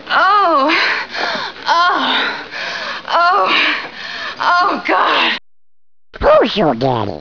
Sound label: orgasm